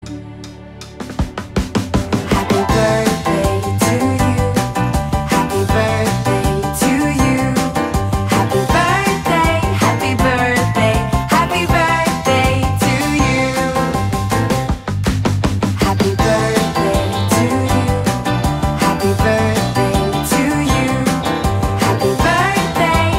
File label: birthday eng